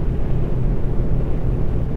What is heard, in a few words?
thruster